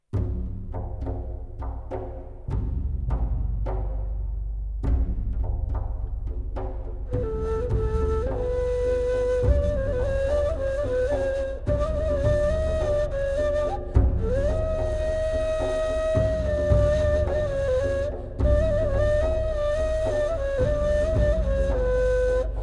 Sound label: Sufi House; Civ5; Middle East Africa; War